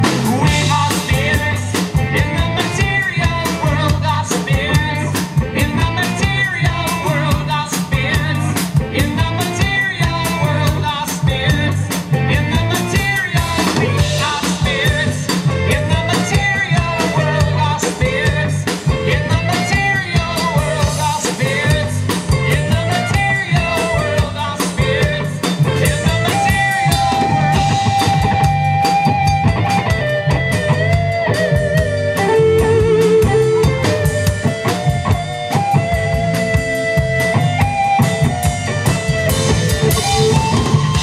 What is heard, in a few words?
Solo